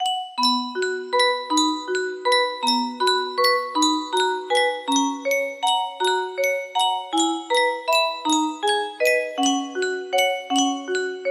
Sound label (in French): yunsheng boite a musique, music box melody